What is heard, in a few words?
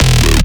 bass hit acid glide, Bass Stabs; Virtual Riot